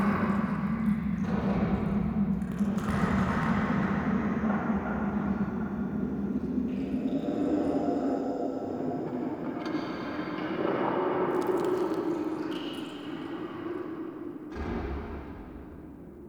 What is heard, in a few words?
Under City Large Room Ambience Loop, Dungeons; Beds